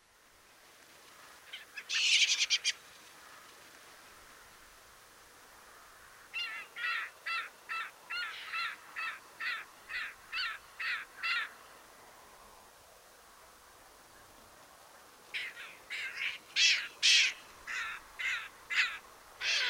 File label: white throated caracara, bird sounds calls